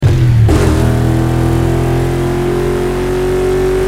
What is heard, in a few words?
second, vehicles